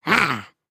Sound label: Spawn; Lego Strombie; creatures organic